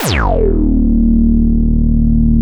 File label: OB D#2 -L, Rare Synthesizer; Hollywood Edge - Giorgio Moroder Rare Synthesizer Collection; OB 4 VOX.